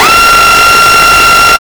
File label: GEIGER, Hard & Loud Techno; USB Soundscan vol.01; AKAI